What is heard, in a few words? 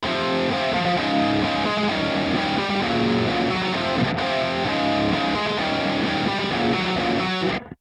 GTR chords